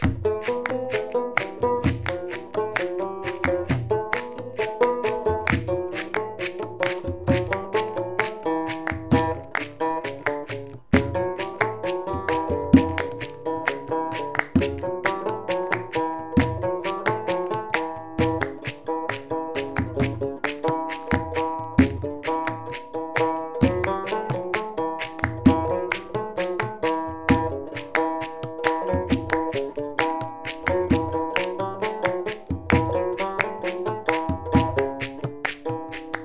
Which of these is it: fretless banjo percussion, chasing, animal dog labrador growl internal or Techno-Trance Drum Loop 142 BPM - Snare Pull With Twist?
fretless banjo percussion